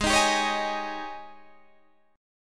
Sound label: G sharp Major 7th Suspended 4th